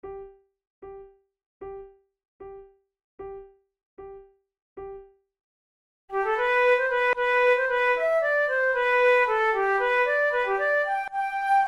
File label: duet, flute tunes